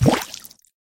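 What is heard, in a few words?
attack hit; clancy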